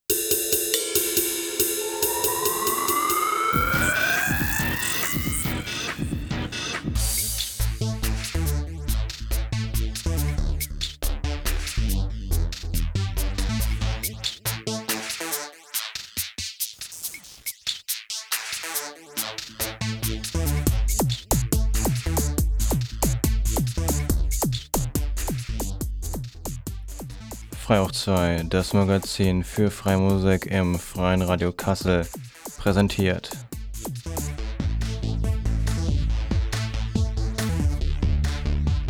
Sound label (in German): House Jingle; frei House